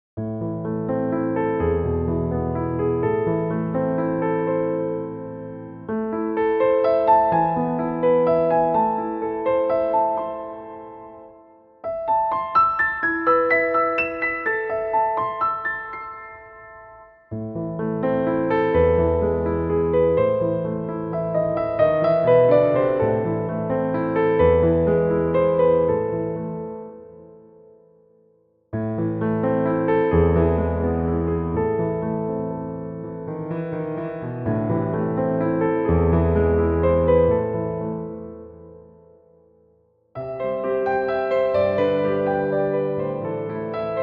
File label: Fur Elise, Underscore